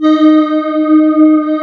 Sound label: CMI ORGAN